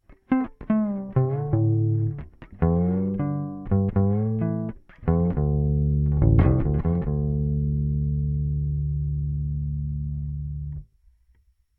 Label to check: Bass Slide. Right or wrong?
Right